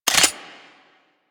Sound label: Heavy Shotgun Fire Mode Buckshot; Weapons; Warframe; Tenno